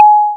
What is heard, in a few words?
plinky; DumbDrum